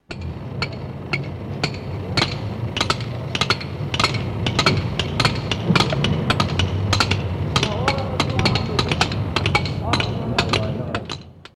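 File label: Hammer On Metal Stuff, anvils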